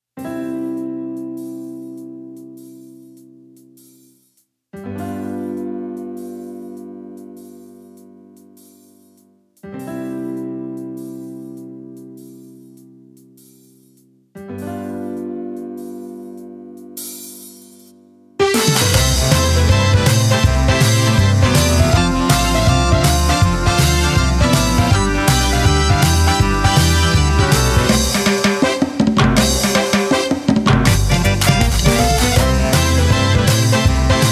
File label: animal voices karaoke